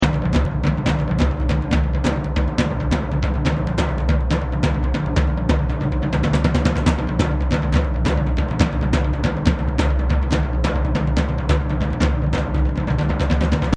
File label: Aggro Agg loop all drums; Outdoors Old